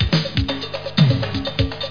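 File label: conga, loop, percussion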